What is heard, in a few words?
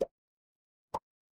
cartoon pop